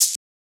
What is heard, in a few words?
Shaker; FLPRO